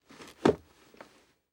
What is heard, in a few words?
Household Chair Sit Down In Wooden Chair